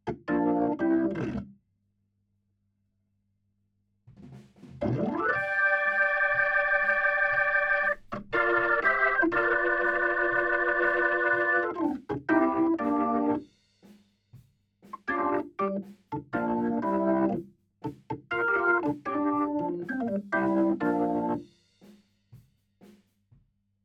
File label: HAMMOND